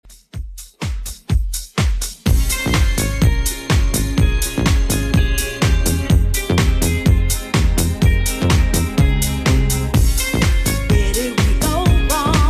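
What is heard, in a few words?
90s Redrum 125 bpm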